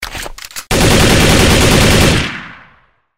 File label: Css M4a1